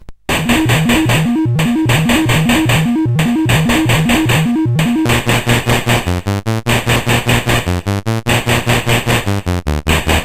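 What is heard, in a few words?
Puppys, MOS 8580 SID chip music, games; CSG8580R5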